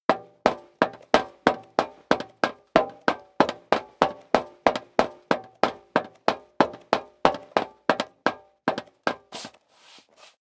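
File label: step leather shoes metal dry run sequence, footsteps; schritte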